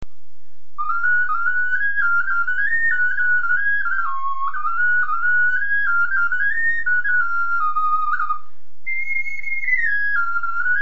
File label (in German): tonflö klein